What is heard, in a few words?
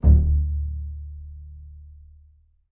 D1, bass pizz; Xsample VOL-5